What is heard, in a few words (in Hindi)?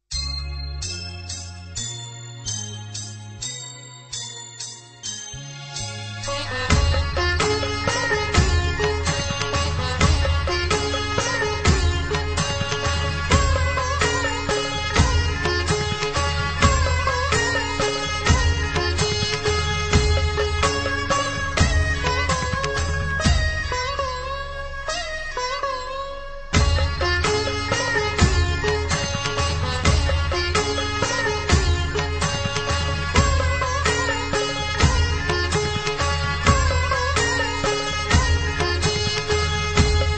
सवगतम मयजक Welcome Music; ARYA VEER DAL MUSIC